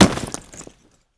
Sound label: body impact